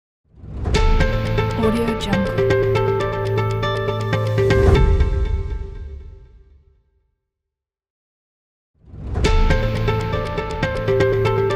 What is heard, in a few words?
news time logo intro